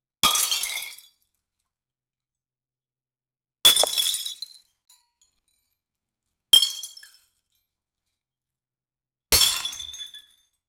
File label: the sound of a vase, accident